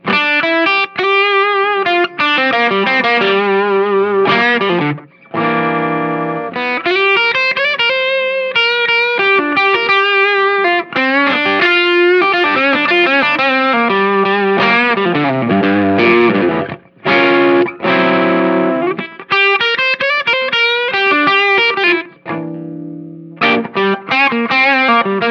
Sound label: dirty blues bridge pu